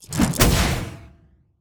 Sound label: dice; game chaosbear